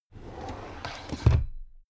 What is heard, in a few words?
drawer cabinet crammed close regular